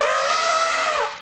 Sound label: idol give ball; sfx